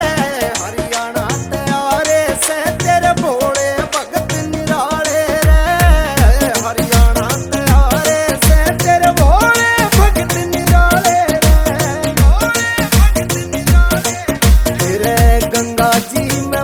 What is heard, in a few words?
Ringtone